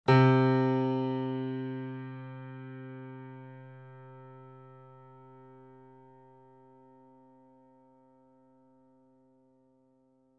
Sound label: C3 E3 A3 Grand Piano & Sample Piano; Sound Sampling Collection VOL-3; Grand Piano 88